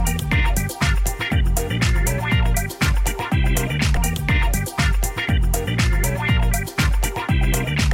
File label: lobby